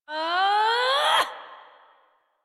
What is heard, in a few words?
Exert Adult, Melee; Warframe; Duviri; Drifter